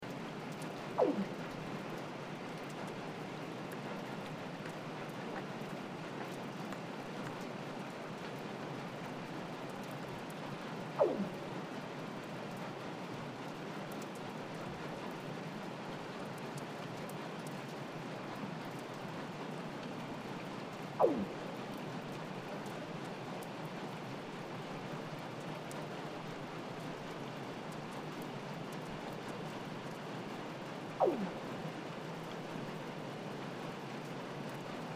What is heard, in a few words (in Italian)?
air gun, rumore subacqueo; acque marino costiere e lagunari